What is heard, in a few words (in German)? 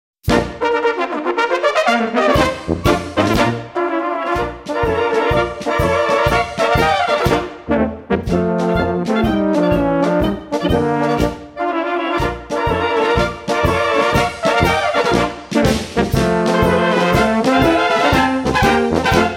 Marsch Böhmischen Blasmusik